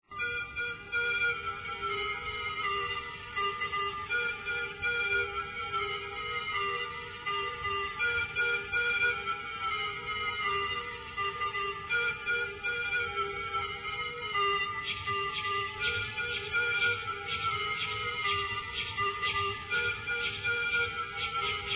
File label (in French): orgue; two anthems from 90s